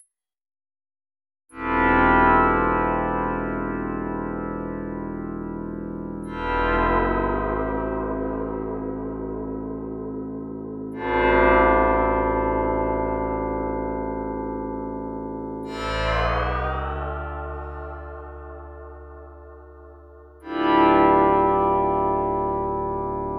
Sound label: drum